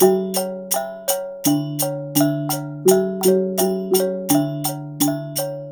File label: WYANG L, Heart of Asia